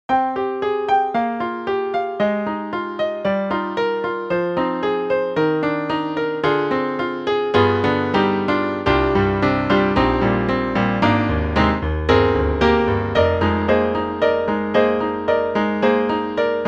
Acoustic Piano; Steinberg HALion One

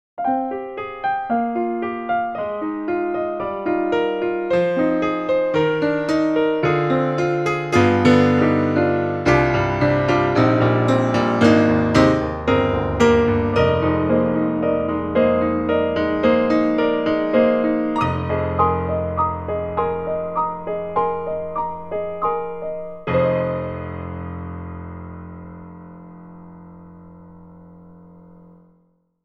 Splendid Grand; SoundFont2

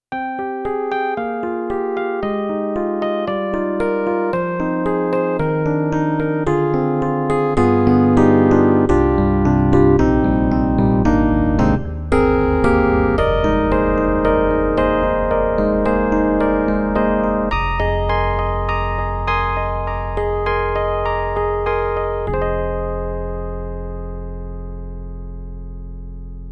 YAMAHA MU90 Electric Piano 2

